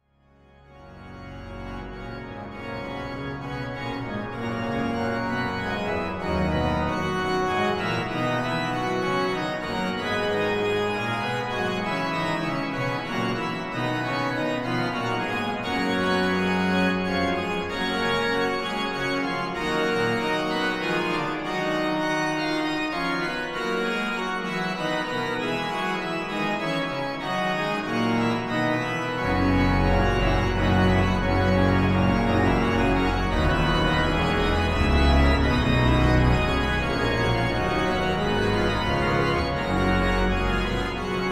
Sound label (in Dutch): Praeludium en Fuga C-Dur; BWV 566